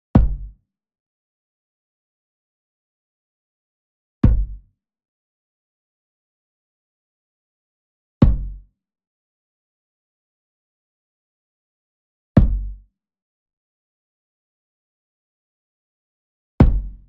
SMALL DRUM Tabla Hit Soft Beater Near; boom library; Cinematic Strikes Construction Kit

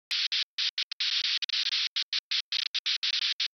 Radio Static Sci-Fi Cut Off Radio Static; Hollywood Edge; sound designer's toolkit v2

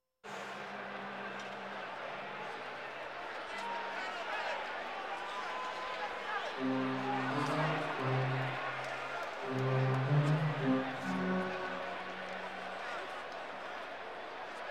Sports crowds

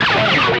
Pickup Guitar Scrape; Pickups

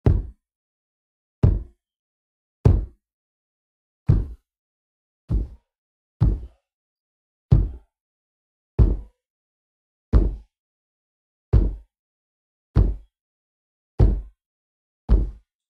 hitting a wall; human; NS Studios - ultra sound collection

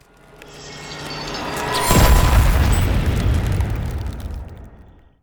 Ice Spell Explosion Impact Permafrost Explosion; Ice Explosions & Impacts; Arctic Aura

